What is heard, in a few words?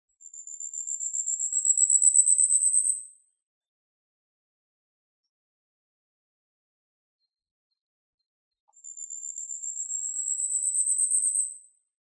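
yabusame song, Asian stubtail; saezuri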